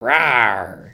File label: Dragon Emote; emotes